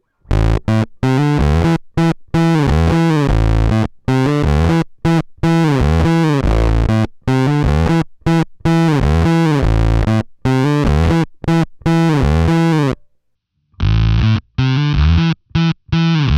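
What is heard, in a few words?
octavius squeezer filter, bass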